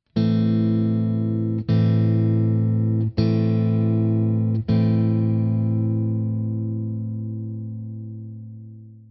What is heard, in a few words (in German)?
Zupfmuster, gitarre